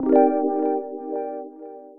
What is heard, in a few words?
minor harp gliss wah; Synth Misc; Ableton; Factory Packs; Beat Tools